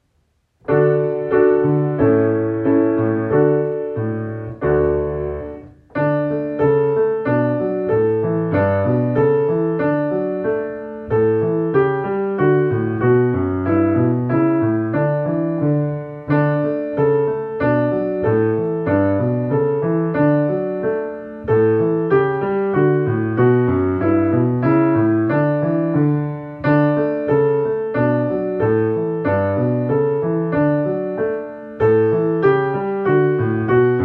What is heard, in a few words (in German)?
Klavier; Bogenwippe